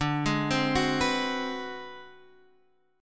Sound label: Ab 7th Sharp 9th Flat 5th, chord